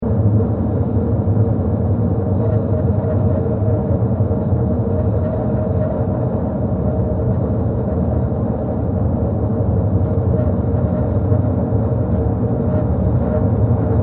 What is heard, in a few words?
Deep Space Ambience, 120bpm; Sound Design